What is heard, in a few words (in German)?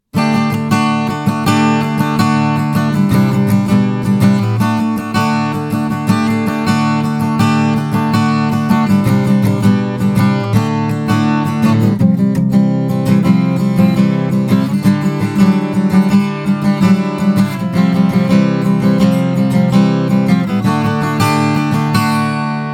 Mikrofon Strumming